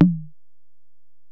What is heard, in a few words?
Tom, Alesis DM-PRO, Drum Machines, Toms